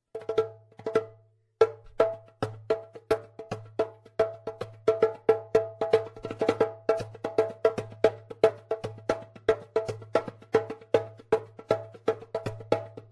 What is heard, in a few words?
djembe senegal petit